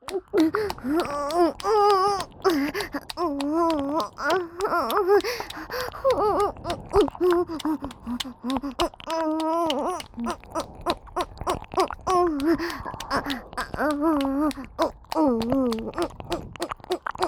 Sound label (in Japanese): オホ喘ぎ＋両耳舐め; 3WAYループ; オナサポ